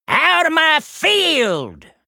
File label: Male Human Attack